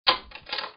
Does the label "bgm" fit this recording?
No